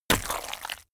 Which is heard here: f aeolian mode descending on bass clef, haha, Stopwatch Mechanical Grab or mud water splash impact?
mud water splash impact